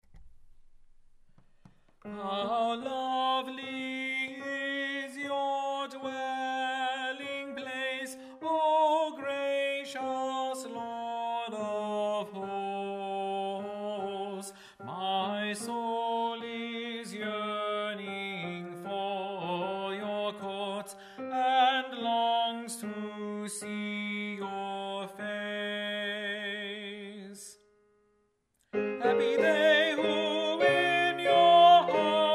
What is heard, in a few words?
Tenor; How Lovely is Your Dwelling Place, R. Proulx; Psalm 84